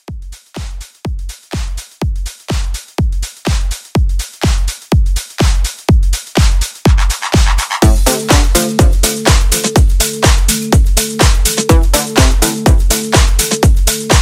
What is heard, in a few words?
Re Drum 124 CLEAN